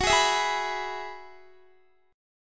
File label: Gb 7th Suspended 2nd Suspended 4th, Guitar